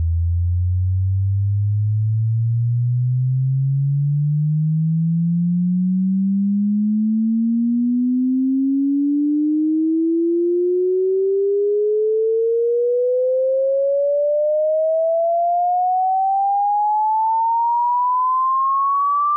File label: Sweep alias